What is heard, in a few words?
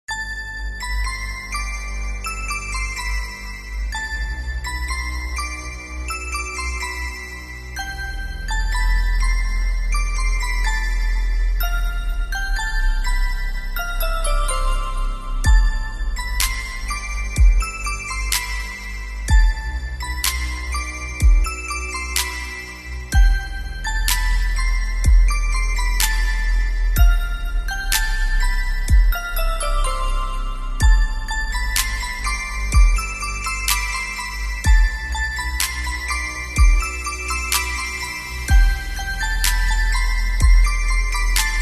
Mobile Ringtone